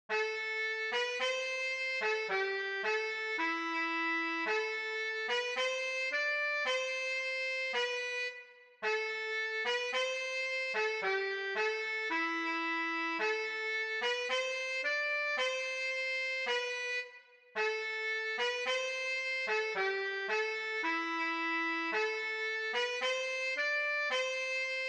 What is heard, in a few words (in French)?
Chalumeau soprano